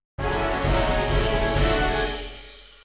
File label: start; hydracastlelabyrinth; midi